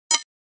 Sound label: sharp, nothing phone